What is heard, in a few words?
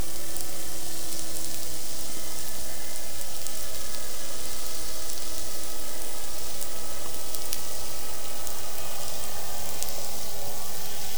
boat and plane